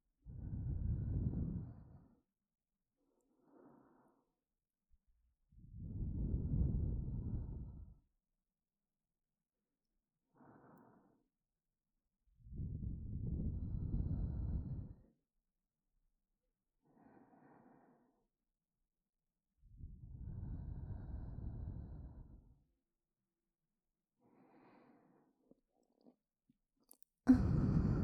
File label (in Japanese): 二度寝耳かき, ASMR